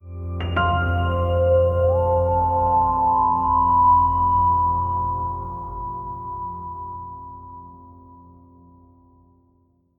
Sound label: Gears